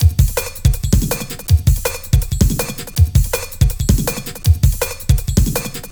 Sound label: 162, breaks, Loops; M8